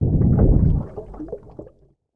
spin Button; OceansGold; netent

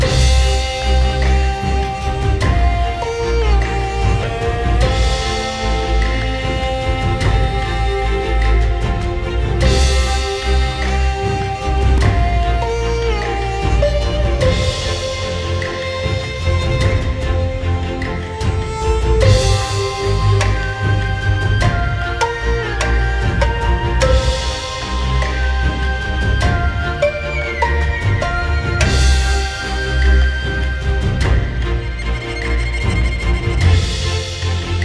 Freespins; netent

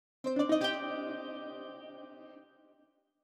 Splash Lute; netent

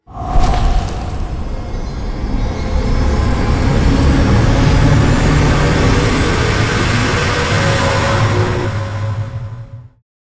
near win riser; netent

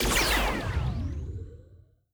Win Symbol Lo pitch; netent

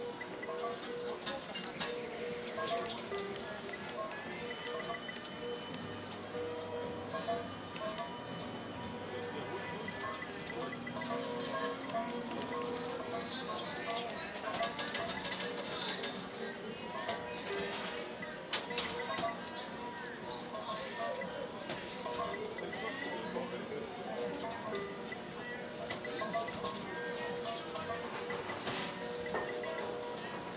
sfx Ambience General; netent

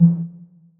slot reel stop

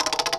bonus Wheel Loop

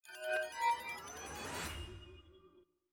near Win; netent

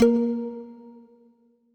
spin Button

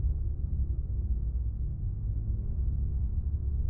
bonus Idle Loop; netent

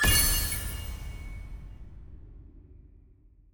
small Win; netent

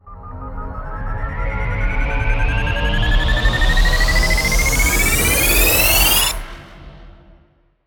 multiplier Count Start; netent; starburst xxxtreme

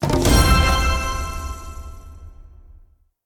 win medium

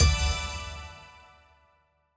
Win attention; netent

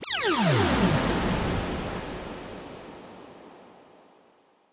mega Win Text Change; netent

